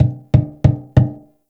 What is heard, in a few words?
Finger Thump; Railjack Hunt